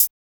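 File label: SLICK closed hi-hat; Drums In Your FACE!; BONUS HATS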